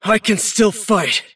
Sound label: cast pain; davion; dota